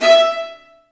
String Sections, Violas Marcato; Roland LCDP13; STR Vas2 Marcato

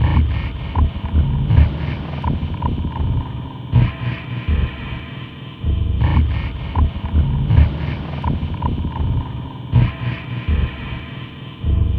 Dark Beat, Dark Organic Processing

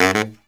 baryt, Killer Brass Riffs

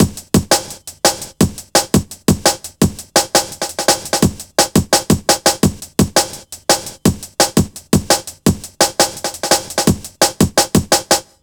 170 break, Explosive Jungle

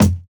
Kick, jungle breaks drum sounds

